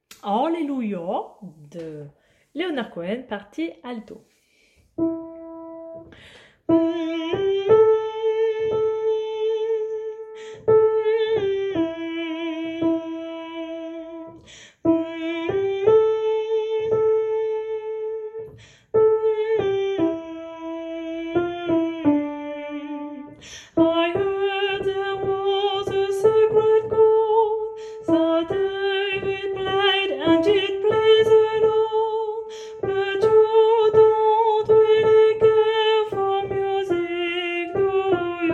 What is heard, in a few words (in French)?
partie alto